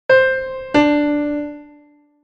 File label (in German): Intervalle sukzessiv Beispiel